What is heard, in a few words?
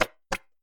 Funny Foot Steps